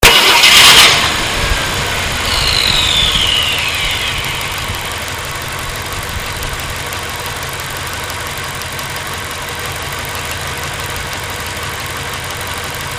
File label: 1966 galaxie 500 xl